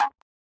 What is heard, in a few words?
nasty stormfront; Nasty Stormfront Electro FX